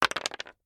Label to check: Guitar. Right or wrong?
Wrong